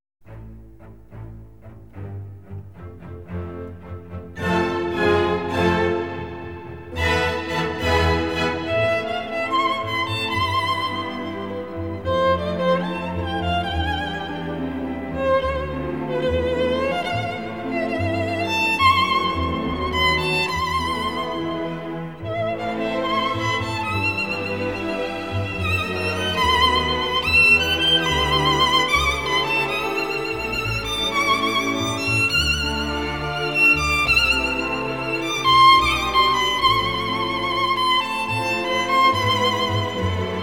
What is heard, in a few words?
Violin Solo; La Septieme Cible; Film De Claude Pinoteau; La 7 Cible [Generique]